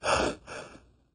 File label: space breath; astronaut